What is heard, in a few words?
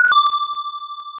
Unused Jingle; Kids Communication Pico